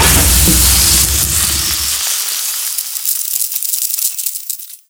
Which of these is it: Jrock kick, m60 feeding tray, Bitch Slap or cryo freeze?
cryo freeze